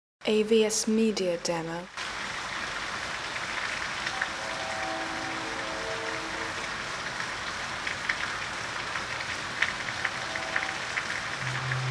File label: Maritime Atmospheres